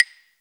clave; AKAI S-Series CD-ROM Sound Library VOL-5; CLAVES

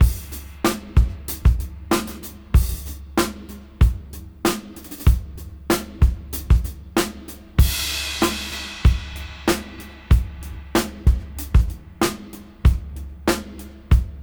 Funk 94 BPM; AMG - Keith LeBlanc Phat Butt Jams

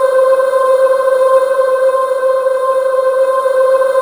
W NOISE VOX, BREATHY PADS; Zero-G Ambient 1

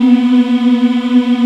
African Mm f; Roland LCDP11 Africa VOL-1

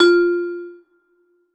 vibes F; Polyend; ARC Noise

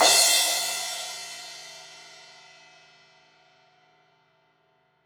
Crash 16in edge, Cymbals

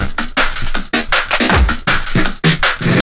JUNGL 160, JUNGLE LOOP

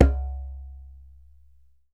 DJEMBE, African Drum

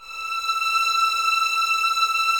E 6, 11 Violins, Soft; Miroslav Vitous

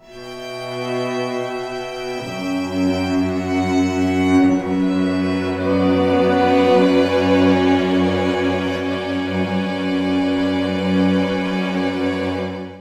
B min, Strings, Drum & Bass; Dual Chamber 9; Total Drum&Bass

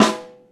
Small Snare, Snare One-Shots; Drum n Bass; KJ Sawka; Drum n Bass & Beyond; Drum Tool Box

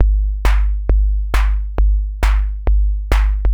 Kick Clap, EDM; Sonic Foundry (Sony Creative Software); Afterhours EDM; Session 10 - Come In Hard

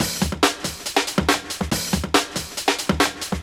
LoFi Beats, 140 BPM

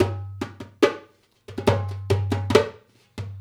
Dumbek; Essential Percussion

